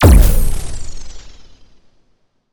asteroid hit Player; Space Wave Race

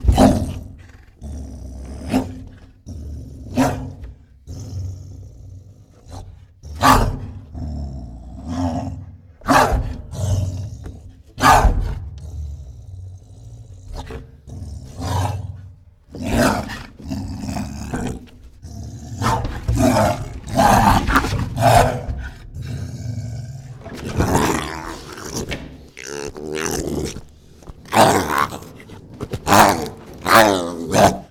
Abrupt, Aggressive Roars, Tigers; Sound Ideas; Tigers Sound Effects

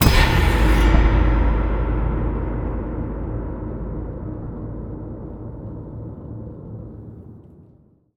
bash, Cinematic Metal Impacts

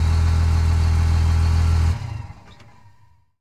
Truck Ram Diesel Idle Off; Trucks

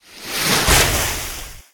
rain splash; Blind Drive

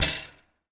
Street Ball Chain Rim, Street Basketball; SFX Kit

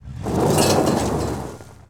Auto Tires Peelout Gravel; Automobiles

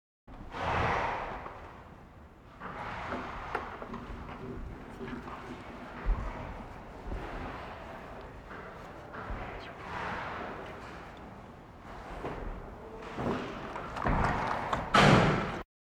Animal effects snorts grunts farm ambience

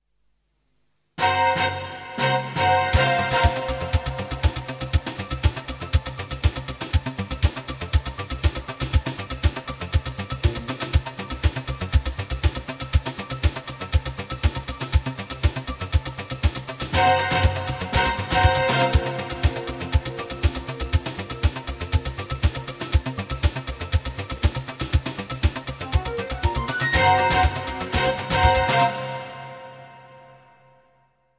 jingles; 90 DDD Jingles

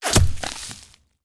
Bullet Impact; Sound Ideas; Guns

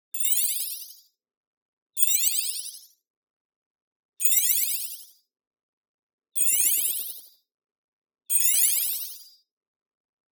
BLING Delay Pitch Ascending; boom library; Anime Essentials

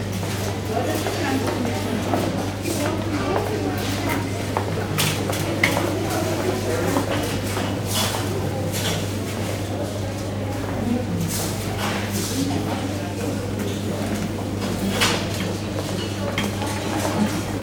supermarket amb child, surroundings; best service Blue Box

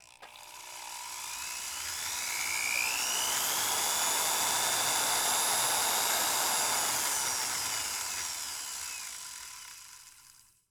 Dentist Drill Run; Medical; Blastwave

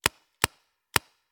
Paintball Gun; Sound Ideas; Guns

